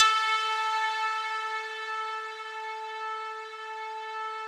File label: RESO METAL A4, Yamaha VP-1; Music Store